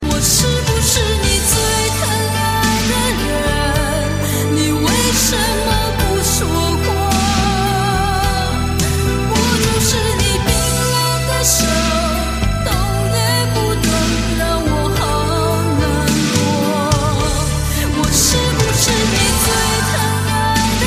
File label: chinese song ringtone